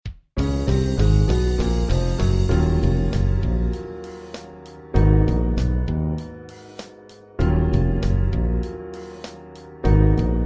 Accompaniment

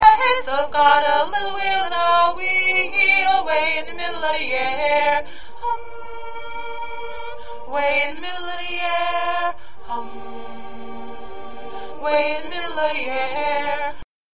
2nd Alto

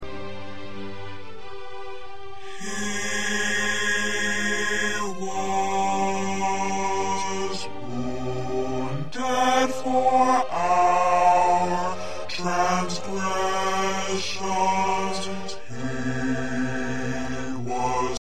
Bass

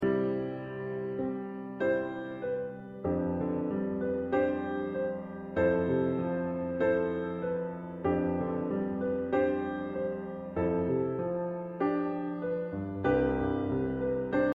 Piano

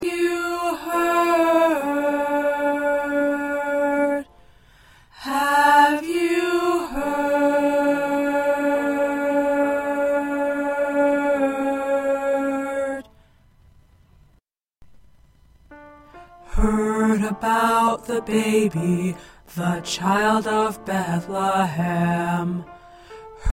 2nd Tenor

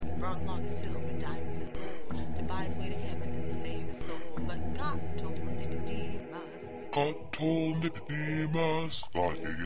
Bass

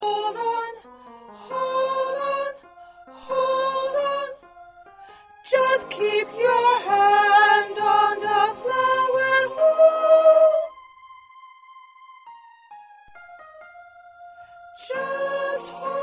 High 2nd Soprano, choir parts

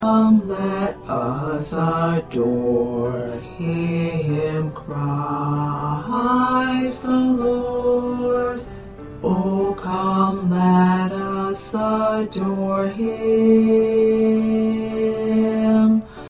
Baritone

